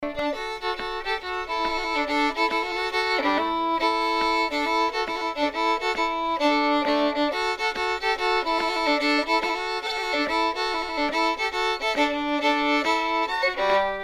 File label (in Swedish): Komp